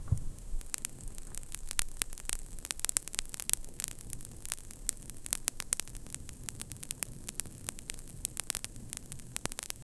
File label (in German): starke knisternde flammen, campfire